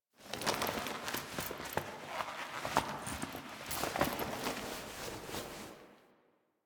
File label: Cloth Movement; Warframe; Quests; The New War; Drifter; Foley